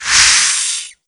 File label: Spider Die; Wild West Shootout (Arcade) (gamerip) (2019)